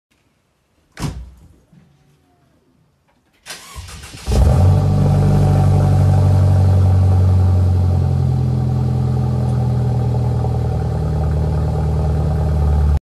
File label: Benz C200